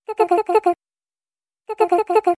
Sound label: Sms